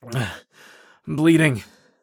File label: bleed in; Escape from Tarkov